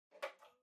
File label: Calculator Far Battery Remove